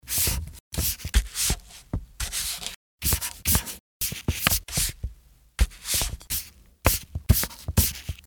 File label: writing brush